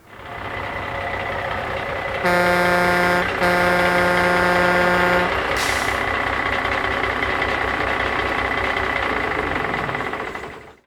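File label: 18 wheeler; The Mustang Anthology 1999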